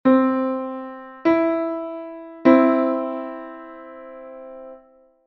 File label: 0-400 major third (12-EDO)